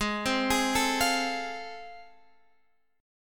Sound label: A Minor Major 7th Sharp 5th, Ab